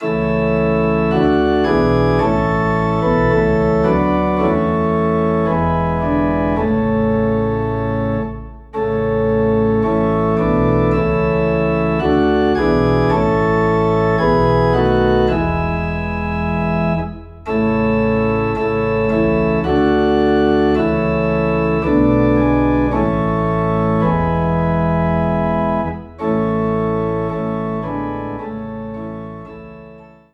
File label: Organ Low in A; Jesus Shall Reign